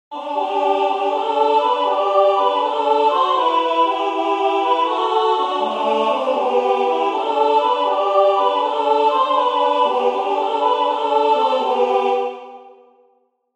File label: I saw three ships parts Soprano Alto